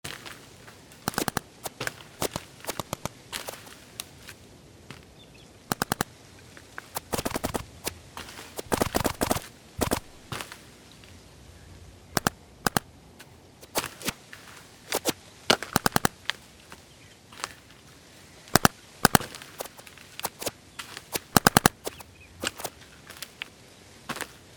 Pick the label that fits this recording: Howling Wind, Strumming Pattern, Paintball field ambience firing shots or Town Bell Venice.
Paintball field ambience firing shots